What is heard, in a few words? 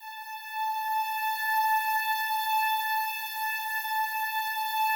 slow strings A4, Yamaha VP-1; 90 s Sample CDs; Music Store